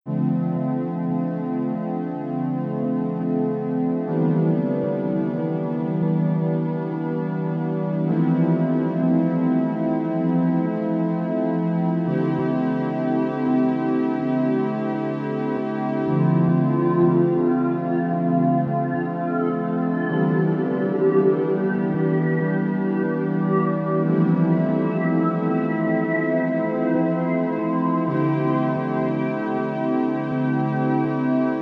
magic music ambient magic calm 432 hz

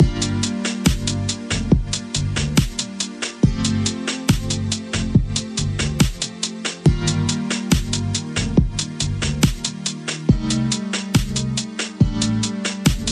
Groovy Chill Funk

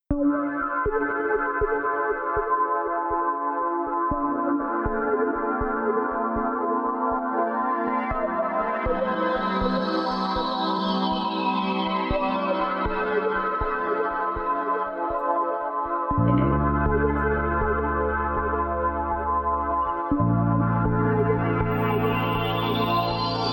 guitar chill 432 hz; opening door

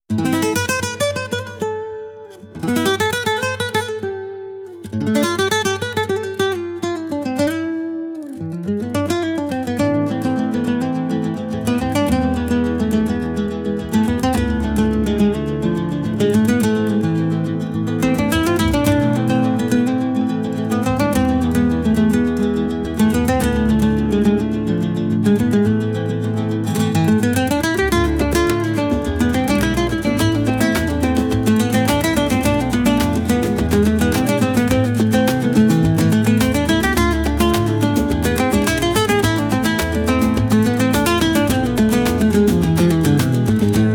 flaming strings

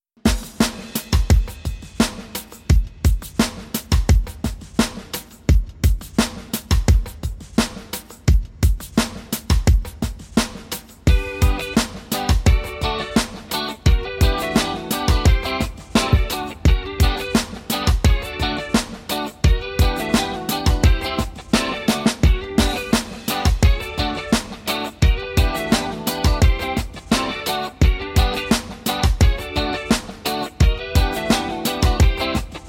acoustic vibes; 432 hz; subtle thoughts

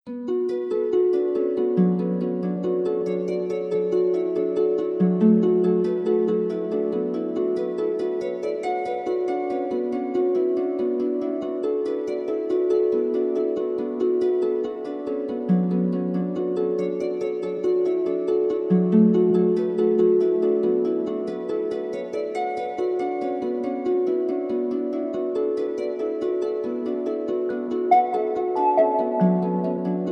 gentle sound 432 hz

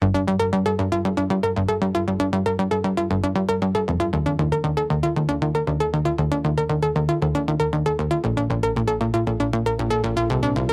Electronic Impulse

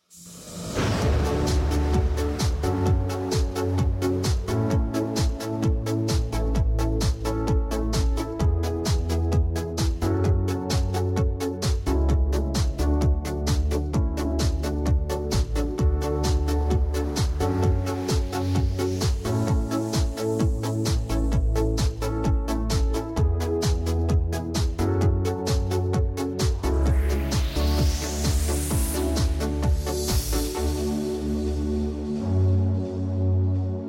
music dance

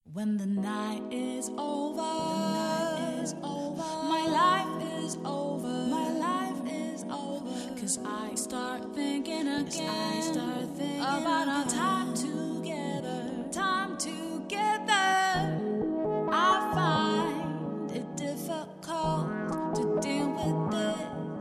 vocally 432 hz

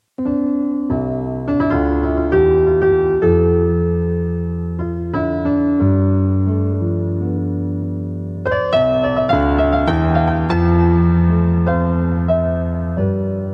piano music 432 HZ

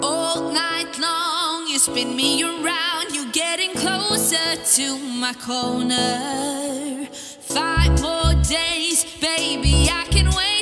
Slow Funk; Unhurried Wanderings

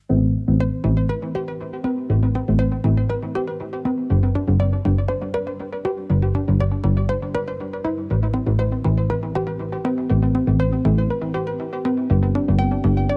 Electronic Impulse

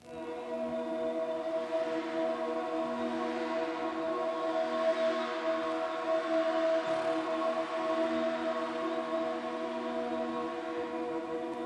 ambient meditation AMBIENT WALK

